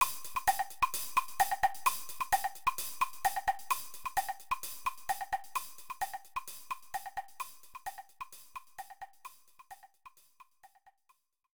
Dance - Thematic (Solo); Spore Creatures; Nintendo DS

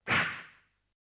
Attack

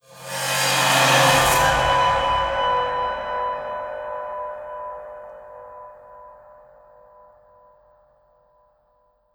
Stinger; The-Cutting

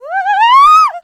Enemy Generic Human Death Eaten

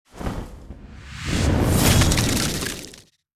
Skill Hurricane; starbites

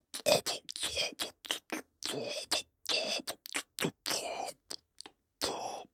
Flukeman Walk Loop

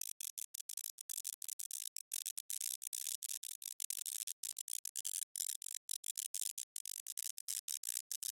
Misc Sparks Loop

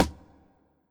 Strike Imp; Gnoll

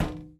Footstep Glass

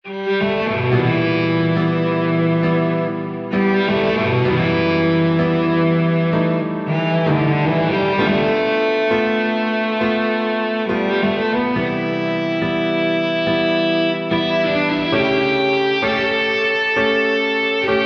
Cello; Cutscene; Dr. Edega

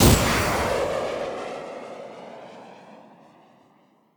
Enemy Army Soldier Bazooka Rocket Launched; Rampage

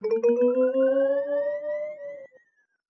Musicbox; Special-Photo Impossible-Triangle

